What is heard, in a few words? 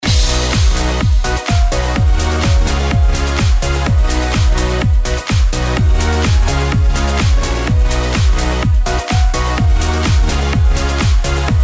Uplifting Trance